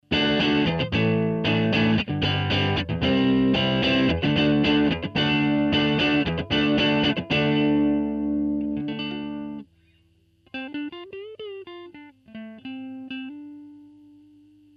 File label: class ab subminiature clean